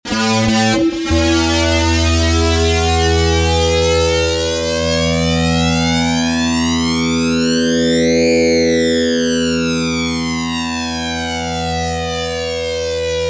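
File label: fuzz guitar mistress reissue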